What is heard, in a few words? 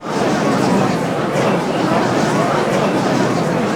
gallery